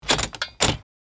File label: Blind lock mechanism locking; blinds; gfxsounds